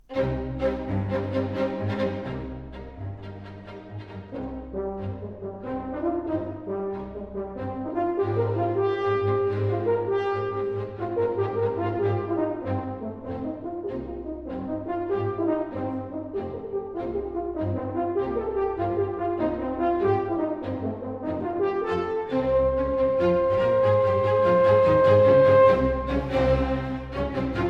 Concerto For Horn & Strings - Mvt. 3. Allegro vivace